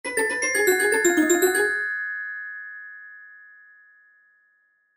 Glockenspiel; Musical; Blastwave

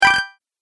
Bonus Collect, video game sound effects; Twistedtracks

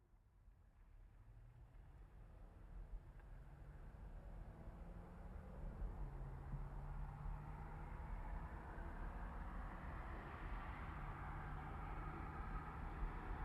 GMC Savana, Up, Stop, Fast, Truck; Vehicles; 2010 GMC Savana; Trucks

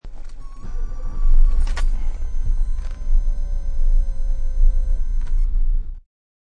Old car ignition starts up.; Cars; Vehicles

